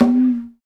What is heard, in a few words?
PRC XCONGA, Skin Drums